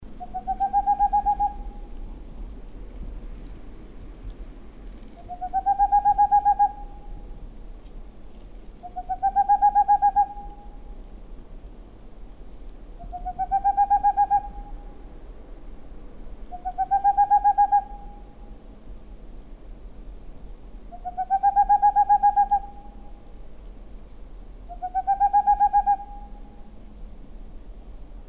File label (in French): Chouette de Tengmalm